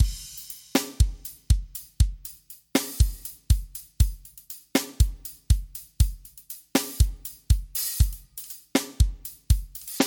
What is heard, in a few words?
SD Arrocha